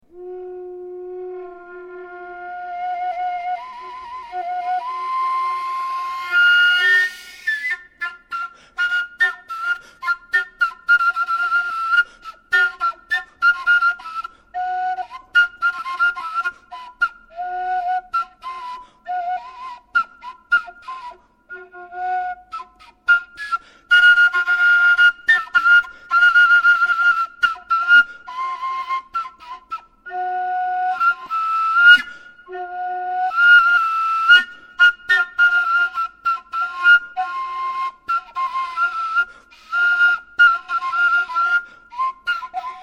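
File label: overtone flute; wind kaval bamboo; oberton flute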